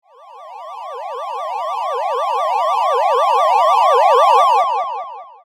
magic gun

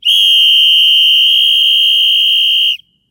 shrill whistle

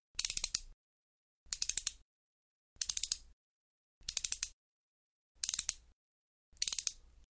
circulating a driver

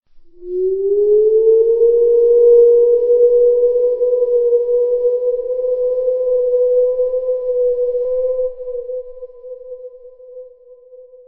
ghost calling; horror

